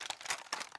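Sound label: inspect movement, weapons; Valorant; cstrike; phantom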